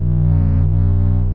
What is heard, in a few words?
camera loop, turret; hl2; entity